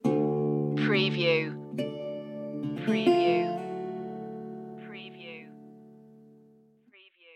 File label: sand and rust stinger